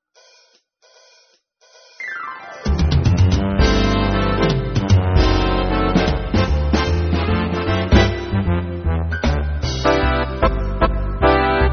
синтезатор; Божья коровка

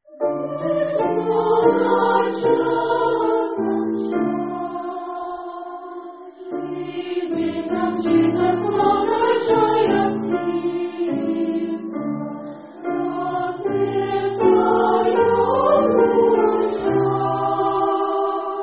Хор старших классов; И.С. Бах; Мелькнет за часом час

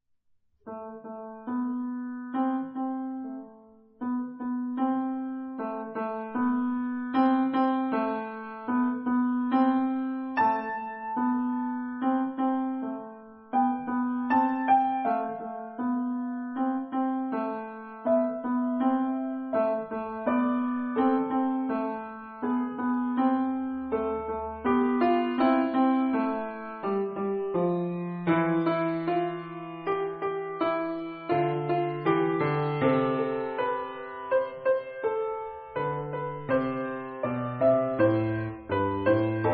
фортепиано; С.Прокофьев; Сказочка

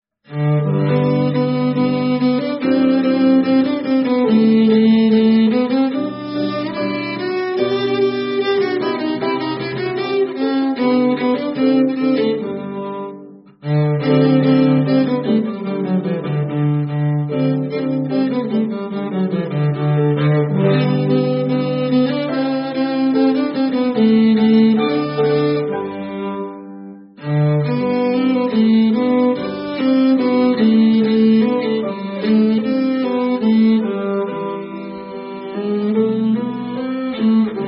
виолончель; Л. ван Бетховен; Контрданс

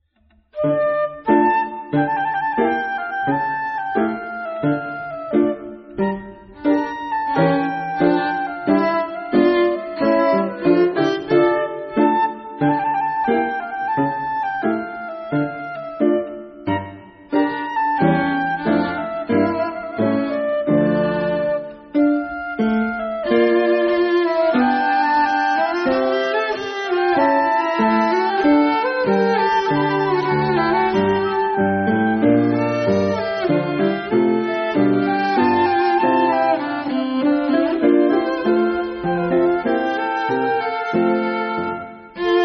Камерное трио; Л. Маршан; Гавот

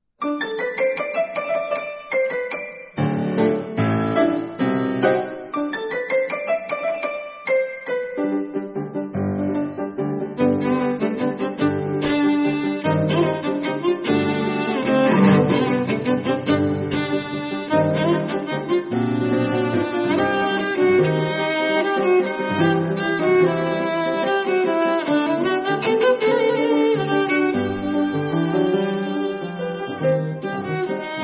виолончель; А. Арутюнян; Экспромт

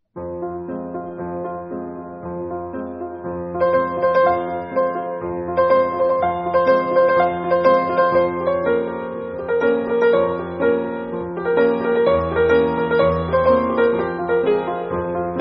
фортепиано; Ф. Шуберт; Фантазия

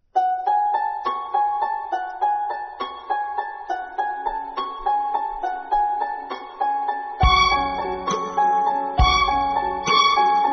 Ансамбль скрипачей; Вдохновение

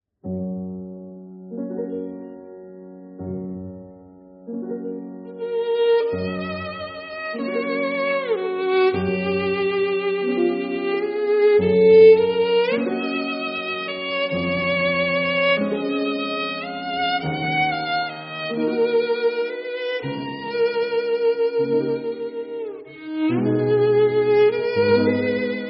скрипка; П. Чайковский; Второе скрипичное соло из балета "Лебединое озеро"

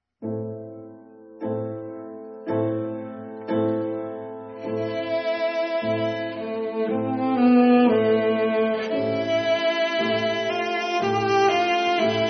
виолончель; П. Чайковский; Ариозо из кантаты "Москва"

